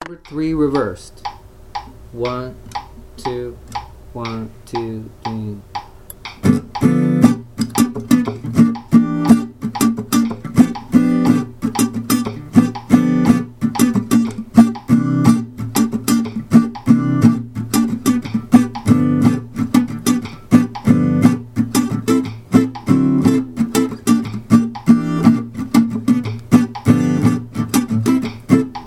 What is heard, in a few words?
claves original clave comping 12 bar blues reversed